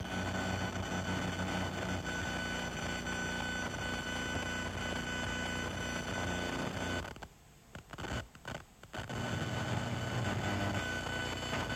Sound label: equator noise